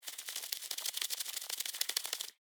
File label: Insect Wings Move, Animal, Insect, Wings, Movement, Shake, Bug